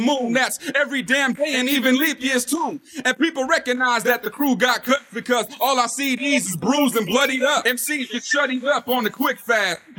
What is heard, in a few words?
Vocals